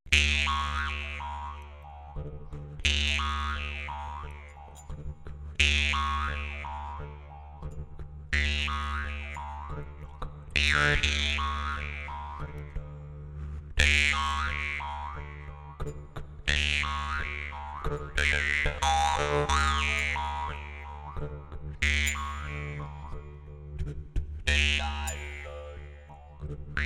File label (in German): Maultrommel